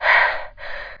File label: breathe; Daikatana; mikiko